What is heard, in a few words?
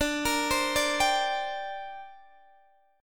D 7th Suspended 4th Sharp 5th, down, Guitar, Open D